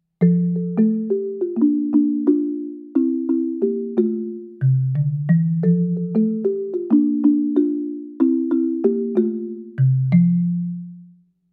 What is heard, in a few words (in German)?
BX 1600 - mit zwei Schlägeln; studio-49